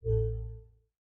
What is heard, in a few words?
drag upload